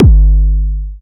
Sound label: Electronic Kick